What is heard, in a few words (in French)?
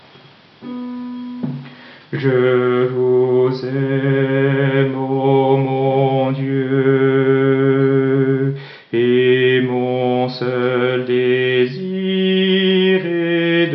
je vous aime alto